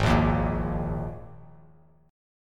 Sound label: Ab Suspended 2nd; strummed; JGuitar